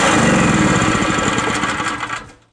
generator stop

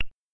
keypress standard

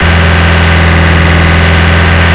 i4 diesel large, engines; acf engines

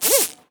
arm in; captainbigbutt; carbon miku

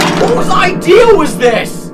piano idea

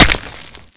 impact

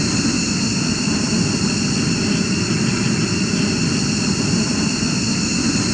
jet, thrusters

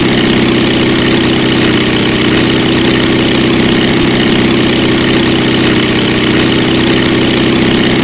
b4 petrol small, engines; acf engines

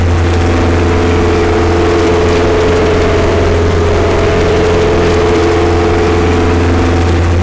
i4 diesel, engines; acf engines

